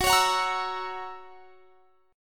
Gb 7th Gb down, chord; Listen to Gb7 strummed

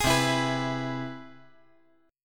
C Augmented Major 7th

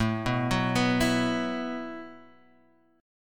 B 7th Suspended 4th A

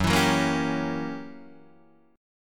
Fsharp 7th Flat 9th down Guitar Open D

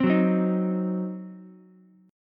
C Diminished C, Ukulele; strummed; JGuitar

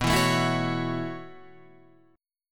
B 9th Suspended 4th B down; B9sus4 strummed; chord shape; JGuitar

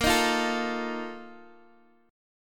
A sharp Major 7th Suspended 4th, Guitar DADGAD

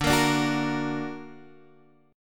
D Augmented Guitar Drop D, chord

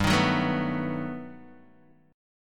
G Augmented Major 9th G down Guitar DADGAD

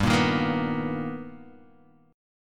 B Augmented Major 7th G, chord

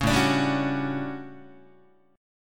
A 13th A down Guitar; strummed

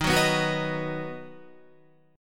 D sharp Minor Sharp 5th D sharp down Guitar; D#m#5 strummed; JGuitar

